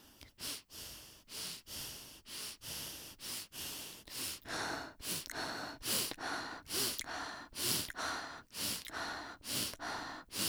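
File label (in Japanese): 呼吸音（鼻呼吸+口呼吸）ロング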